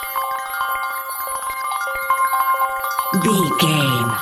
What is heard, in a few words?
time warp healing spell loop